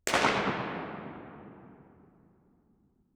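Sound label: Church Center; Reverb; 300 Seat; 20ft; FL Studio 9; Fruity; Edison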